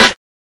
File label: sizzle steel snare drum sample b key, snare one shot tuned to the B note